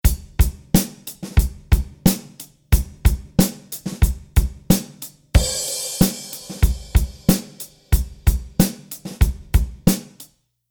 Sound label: Drums Studio Reverb